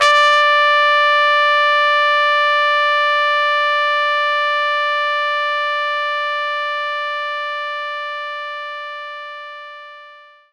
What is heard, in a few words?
Trumpet D5